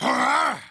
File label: wolf grunt